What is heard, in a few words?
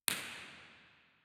Auditorium, Theatres